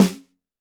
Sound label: Snare, Roland TD-20